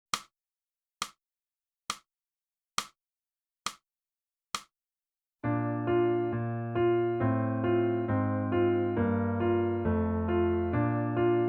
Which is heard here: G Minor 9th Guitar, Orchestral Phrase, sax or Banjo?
sax